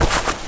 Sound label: foley move, skater; Tony Hawk's Project 8; XBOX